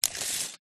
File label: tape measure pull expand